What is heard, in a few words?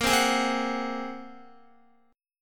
Bb 7th Suspended 2nd Sharp 5th Bb, Guitar; Bb7sus2#5; strummed; JGuitar